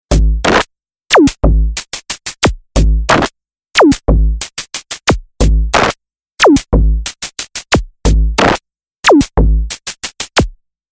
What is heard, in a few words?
Monark